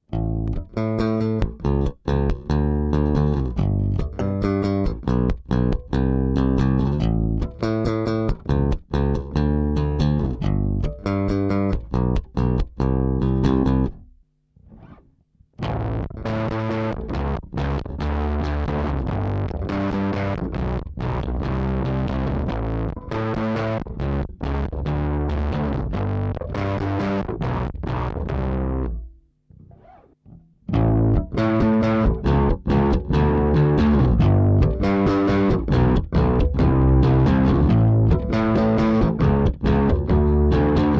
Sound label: Overdrive; bass